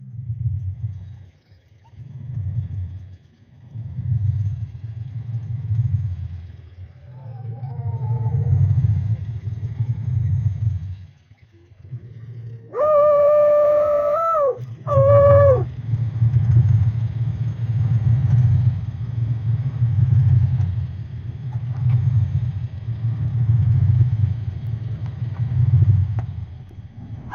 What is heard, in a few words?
a whale mixed with a, aquatic